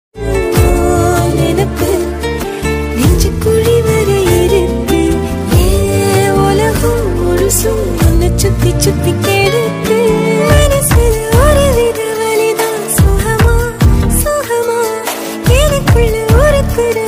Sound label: New Romantic Tamil Love Song Ringtone; ringtones